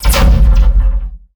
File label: Pickup Scifi Energy; SFX Kit